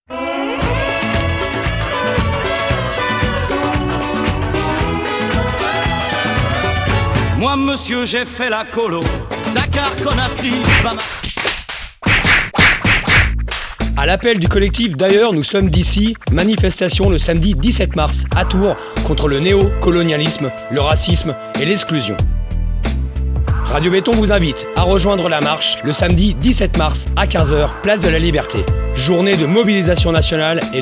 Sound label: Jingle; Beton